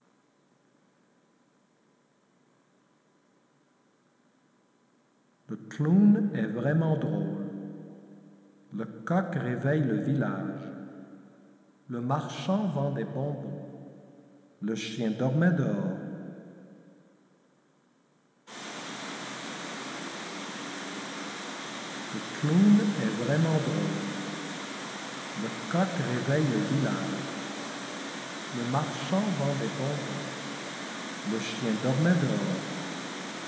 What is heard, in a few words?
Binaural look Direction 300deg HP100